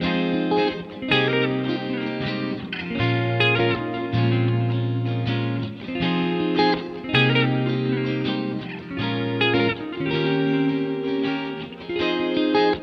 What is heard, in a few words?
80 BPM F Min, Guitar Loops; Vacancy; Cymatics; Journey Guitar Loops